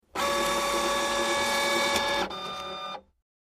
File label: Computer Printer Ink; fax scanner copier